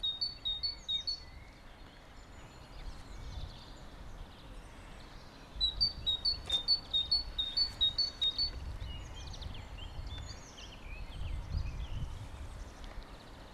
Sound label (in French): oiseaux, Paysages naturels